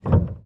piano release; samples-piano

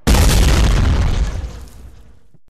rocket launcher explosion; hygrounds

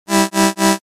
fatal

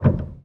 piano release; samples-piano

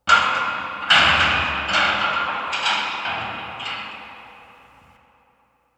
prison noise

